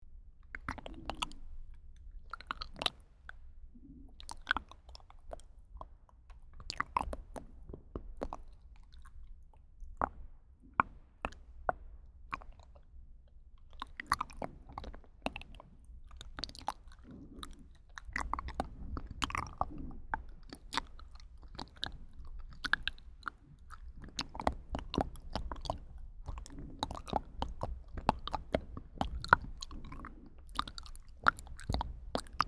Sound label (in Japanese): 至近距離マウスサウンド, ASMR